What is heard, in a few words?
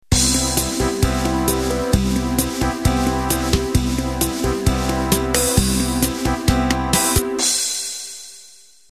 Pop Bossa Intro